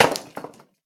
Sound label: impact rock rubble; sound effects one